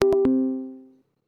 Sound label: end call